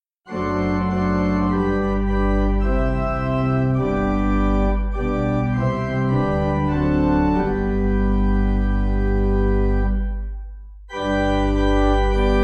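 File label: pipe organ, hymn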